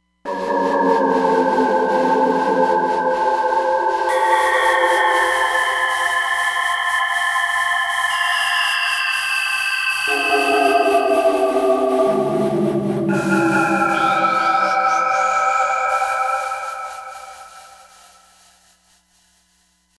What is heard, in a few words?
Gasp